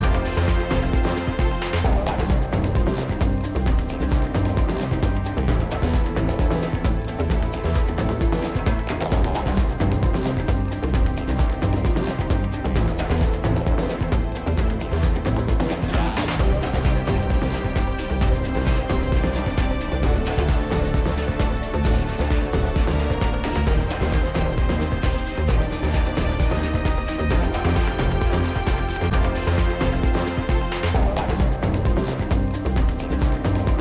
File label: Techno; Batwing Techno